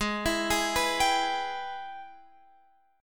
G sharp Minor Major 7th Sharp 5th G sharp down Guitar Open F; arpeggiated; JGuitar